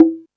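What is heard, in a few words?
Jungle Percussion, Drum Machines; 300 Drum Machines; E-MU Pro-Cussion-1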